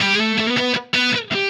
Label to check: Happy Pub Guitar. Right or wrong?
Right